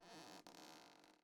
ambience room lair work layer

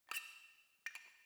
tools

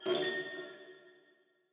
ambience room dark temple ritual ready

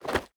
mob grab

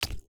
ui click

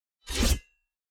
crusader strike sword

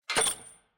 morgenstern put; player

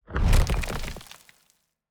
Earth Sphere Attack; TANK; 3Souls

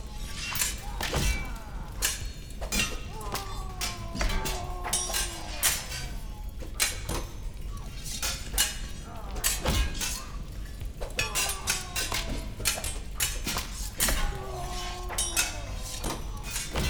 ambience mob battle

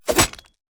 skeleton strike; Shelter

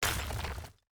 block destruction stone

